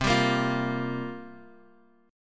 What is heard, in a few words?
C 7th Suspended 4th